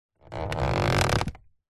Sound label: foley basket hamper picnic lid open or close movement creak; zapsplat